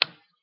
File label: sound click sharp; KSP